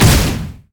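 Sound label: virulent slash uncharged; LoL; skarner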